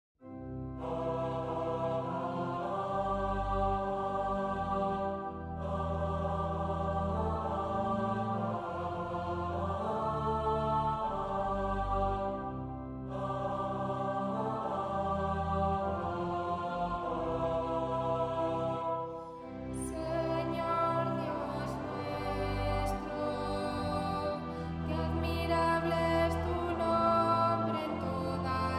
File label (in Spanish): CONTRALTOS SALMO